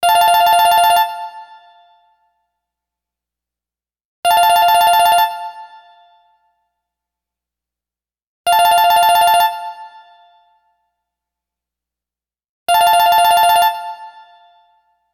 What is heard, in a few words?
Telephone Ring; LG